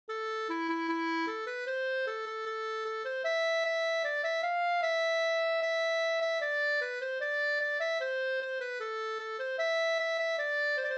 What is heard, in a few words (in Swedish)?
schottis; Kalix; Bo Wikstrom